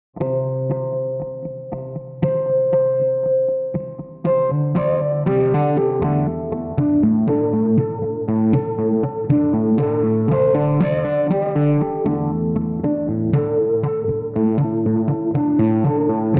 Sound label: tapped Harmonics